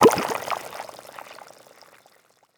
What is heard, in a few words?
water bubble potion; fantasy game material